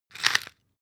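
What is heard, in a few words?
floss pull out rapid, Bathroom; haus-garten; badezimmer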